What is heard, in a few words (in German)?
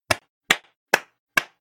klingelton applaus klatschen beifall jubeln